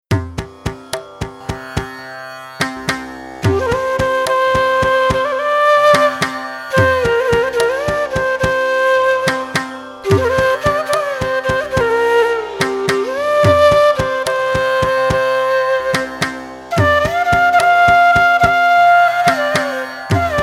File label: Harivarasanam Flute; sabarimala